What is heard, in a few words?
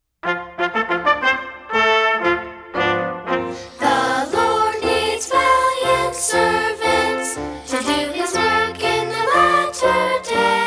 words and music